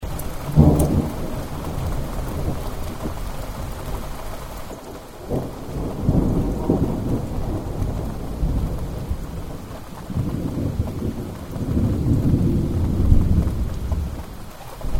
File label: ambience sannohe summer thunder storm loop